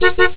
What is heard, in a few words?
car horn